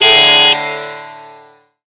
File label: Car Horn; Hyperdrive Massacre; Hindu Hermits Ape